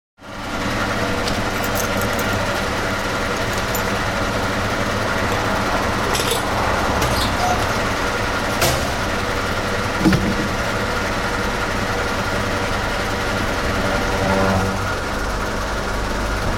Petrol Pump, Car being fuelled at petrol filling station.; Gas Pump; Machines